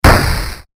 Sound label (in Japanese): 巨大ロボット 歩く 一歩; モノラル